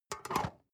storage lid slide close medium, box; objekte

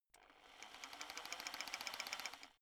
rock drill chisel settled short; schrauben drehen hydraulik

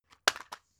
drug package drop; arzt

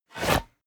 small wood pickup; berufe; foerster

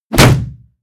hit shield wood; hits impacts

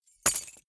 key ring large drop carpet hard

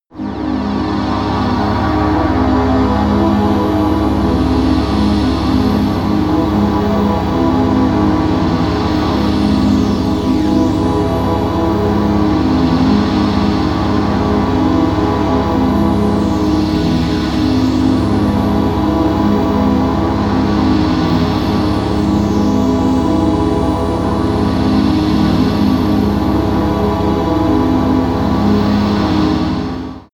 strange clouds, music elements

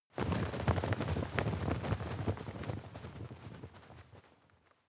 fly movement flutter multiple; bewegung; comedy-cartoon; soundpool

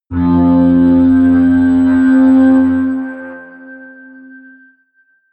atmospheric feedback synthesizer low, drones

